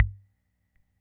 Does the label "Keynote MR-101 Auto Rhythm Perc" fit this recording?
Yes